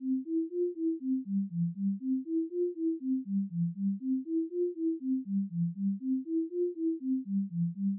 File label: Audio Graph Sinusoidal